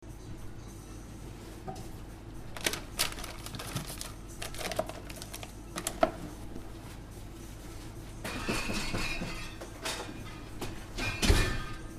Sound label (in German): Kino Popcornzubereitung; Popcornrostung